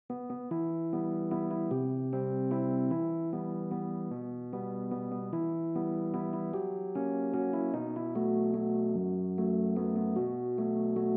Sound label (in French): CLAVIER; Il est bon de louer